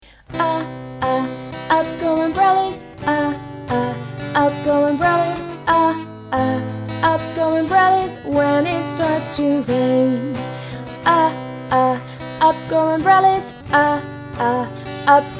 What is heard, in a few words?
U song